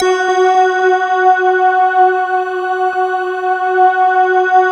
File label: BELL C, Slow Bell Choir; Optical Media International - Sonic Images Library